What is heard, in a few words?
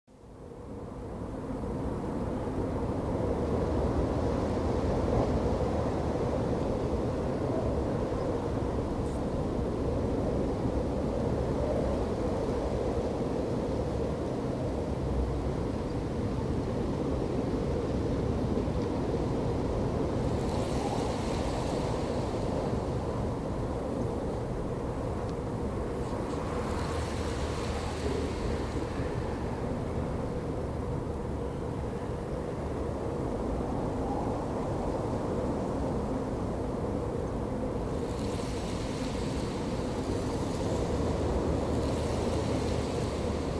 nether ambience